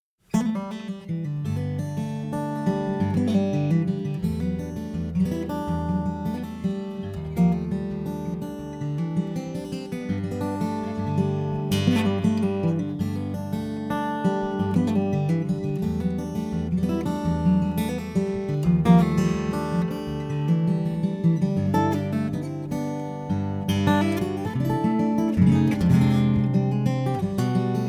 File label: Guitar Noir